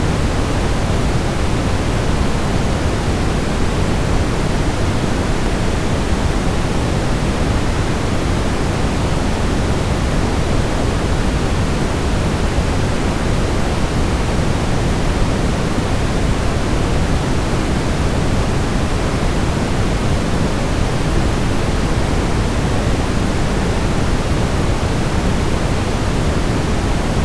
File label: brown Noise; Vinyl-I-Digitized